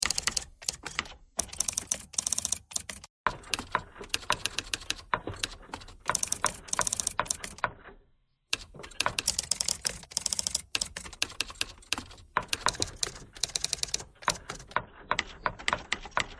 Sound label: typewriter thread harmonic